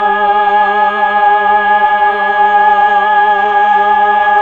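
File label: voice Mellotron choir; Roland LCDP09 Keys of the 60s and 70s 1; Melotron Vox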